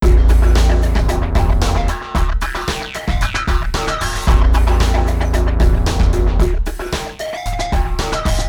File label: med health groove; FMOD Example